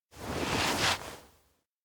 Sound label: beach lie